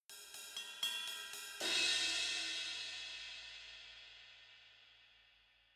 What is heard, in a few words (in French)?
Cymbales